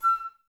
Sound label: Flute, Woodwind